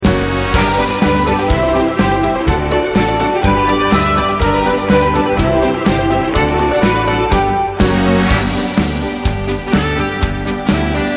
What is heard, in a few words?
Country Polka